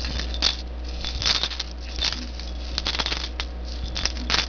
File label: Pick Line; SketchBook 2.1